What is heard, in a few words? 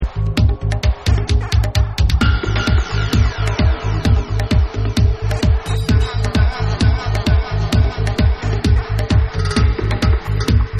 Dub